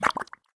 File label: Mud Gulb